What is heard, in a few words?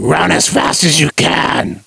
Gore Fear